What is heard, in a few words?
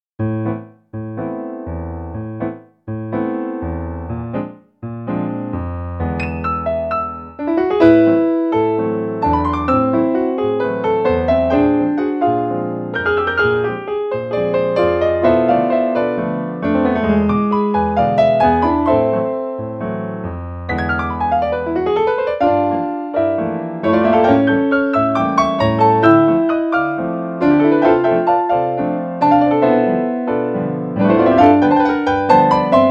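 tango and jazz, piano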